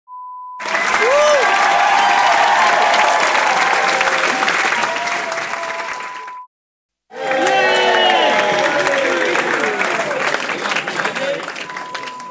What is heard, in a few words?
Applause cheering